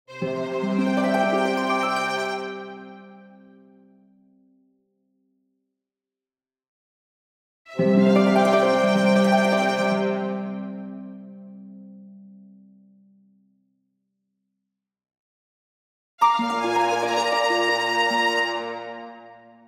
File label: Orchestral Jingle Positive